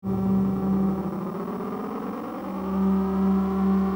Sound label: Accelerate, Driving; Turbo